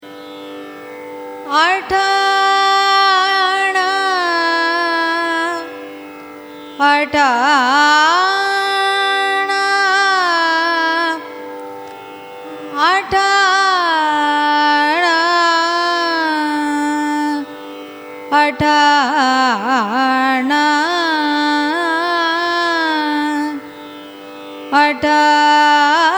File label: raga atana signature, carnatic music